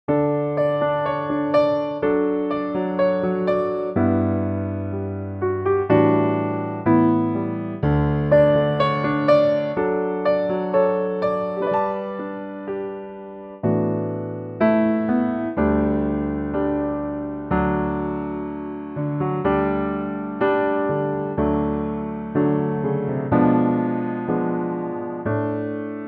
piano D

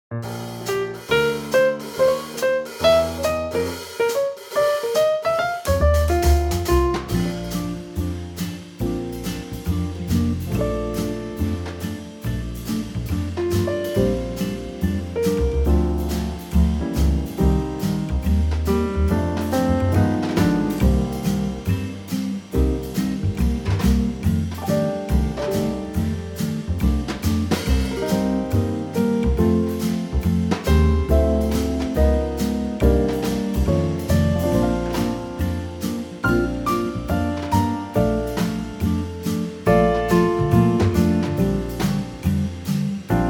swing; Wonderful Quartet arrangement - piano, acoustic guitar, upright bass and brushes - in a lovely light arran

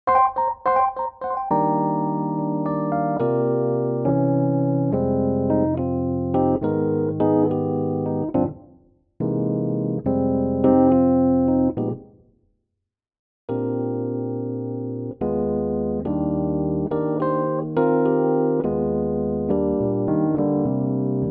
Rhodes Eb

